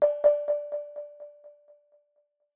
SmartNotif - Operate, Smartphone Notification Sounds; aXLsound